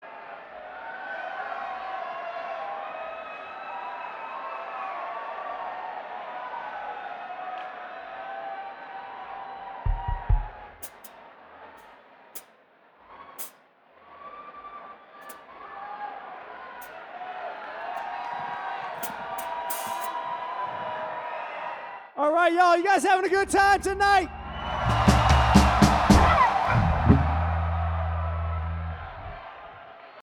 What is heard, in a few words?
Banter Crowd